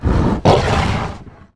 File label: attack, monster spider king